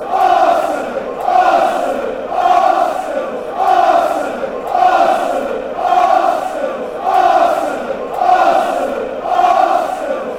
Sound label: arsenal slow tempo, chants